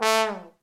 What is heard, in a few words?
THE TROMBONE